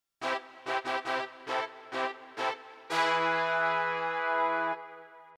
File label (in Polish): fanfara